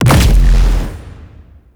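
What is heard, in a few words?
Pod Shotgun Projectile Explosion; Warframe; Weapons; Tenno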